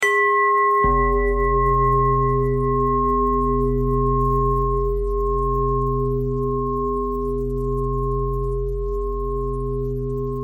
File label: bell thread pentatonic